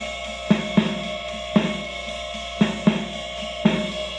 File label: Loop, rhythmate, classic machines